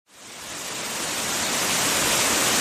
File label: submarine ping